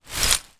glass broken in plastic bag pick up; foley; zapsplat

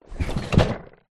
household fabric drawer close storage tidy

